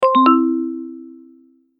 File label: message notification